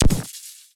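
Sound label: elec wand, impact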